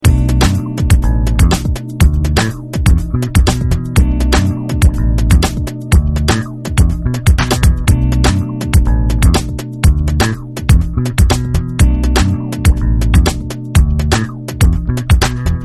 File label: electronic superjazz; sampler electronic